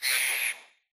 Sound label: Bird DOT; smite 2; Aphrodite